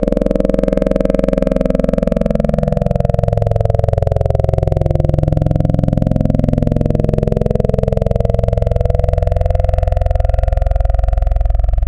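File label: Vocal Multi Vowel, wavetable; Synthetic's Serum Collection; Echo Sound Works Core Tables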